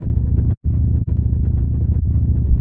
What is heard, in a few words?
shoulder rumble strip loop; Blind Drive